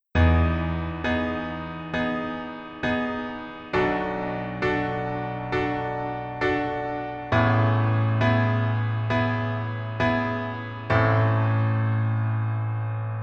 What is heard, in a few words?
Piano ver